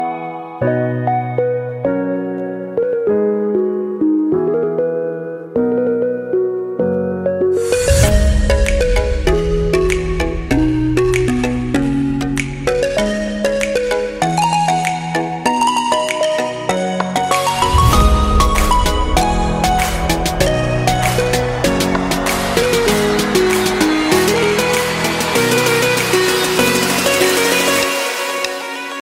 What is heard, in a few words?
english music